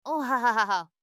laugh exasp

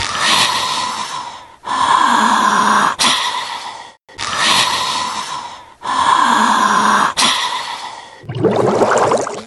heavy breathing; spongebob

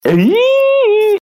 iiiihhhh

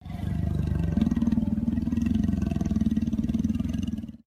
vampire growl

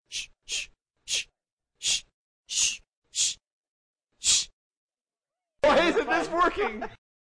shhhhsh

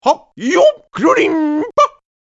tingle

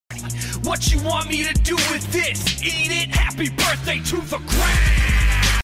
happy birthday to the ground

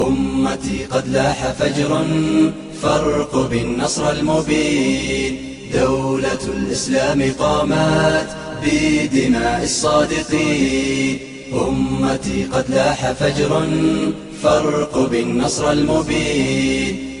arabic intensifies

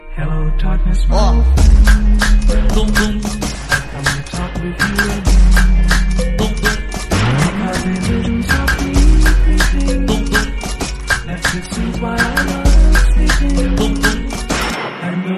funk

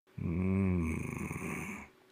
mmmm

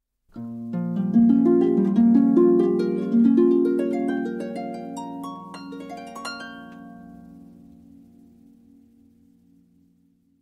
harp

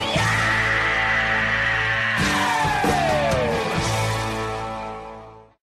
yeeeeaaaahhhh

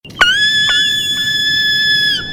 ahhhhhhhhhhhhhhh

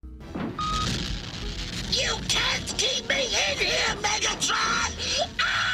screams; starscream

